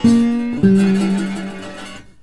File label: Blues Guitar Samples; ILIO - Fretworks